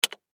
Technology Computer Keyboard Space Bar Single; Typing; Offices

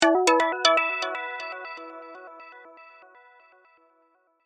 Multimedia Chime, Technology, Multimedia, Chime; Chimes; BlastWave

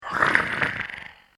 Nasty Goblin Fiend; Monster Sound Effects; fantasy sound effect

